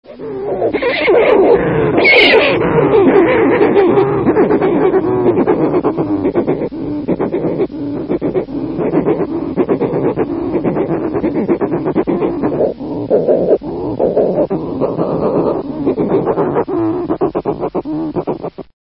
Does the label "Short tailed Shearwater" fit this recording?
Yes